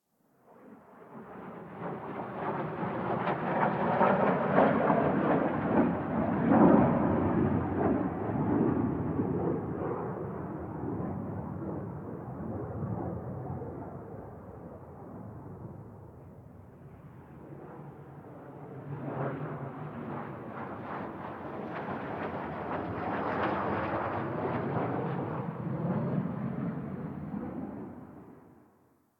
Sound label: Distant Ambient Fighter Jet; Ambience; Warframe; Nineteen Ninety Nine